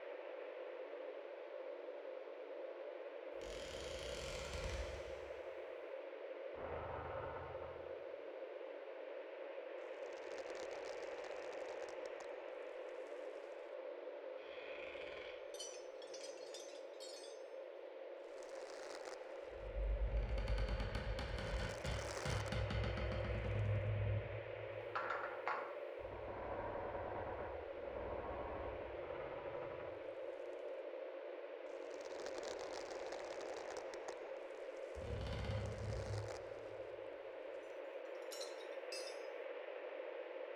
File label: atmos mistmaze